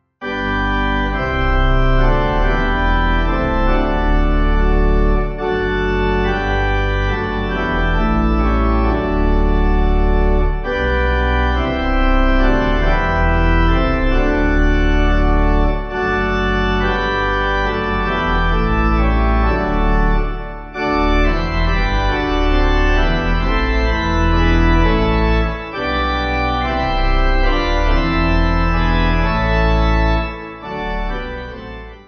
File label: Let Me Be Thine, Pipe